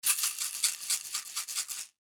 Food Seasoning Bottle Shake Heavy; Household

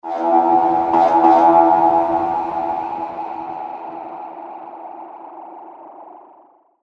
Laser Dirty Bouncer, sci-fi laser sound effect

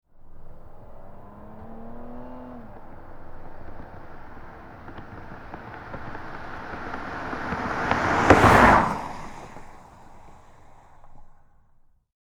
Auto Pass By Car Automobile Overhead; Transportation; Cars; Vehicles

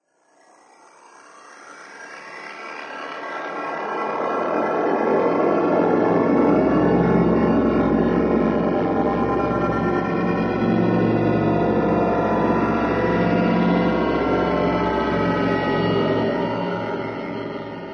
Fearfully, thriller-horror ambience sound; Scary Soundscape

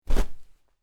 Cloth Character Movement Foley Whoosh; Miscellaneous Human Sound Effects; LynneMusic

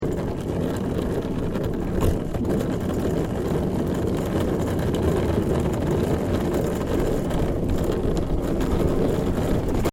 Suitcase Casters Rolling Road Loop; Travellers case; Objects

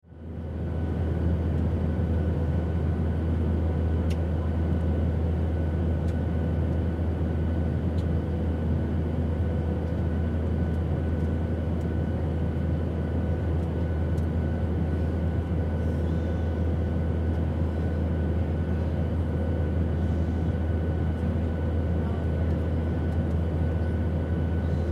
Airplane Interior Commercial Airline; Vehicle; Airplanes

